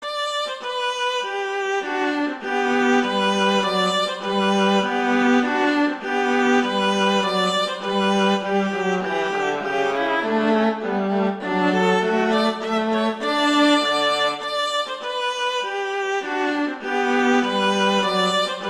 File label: Duet; Mozart; Duets; Vla